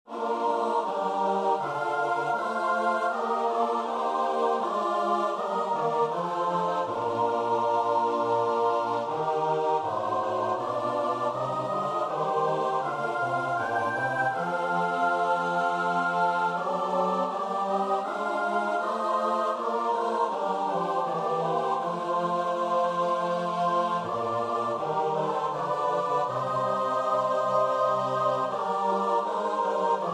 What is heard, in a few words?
st matthew passion, choir; bach